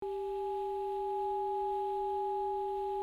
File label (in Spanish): Multiarmónicos